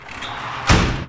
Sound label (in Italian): porta scorrevole